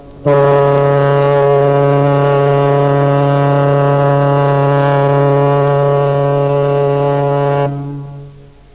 SHIP HORN

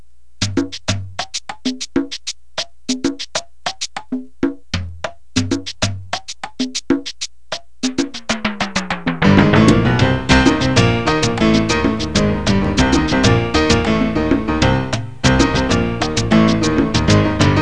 LATINO

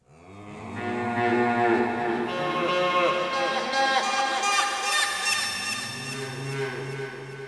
SINGIN; KRONDOR

